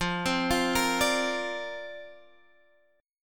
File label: D-Minor 7th Sharp 5th F, chord